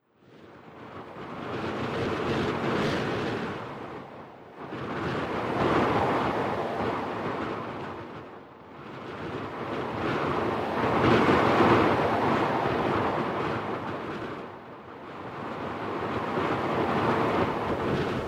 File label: Wind measure